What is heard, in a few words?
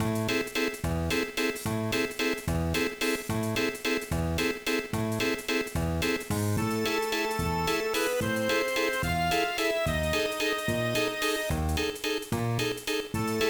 In the Boo House; Mario Pinball Land